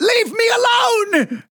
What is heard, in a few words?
Taking Damage; Paladins; Pip; Mad Scientist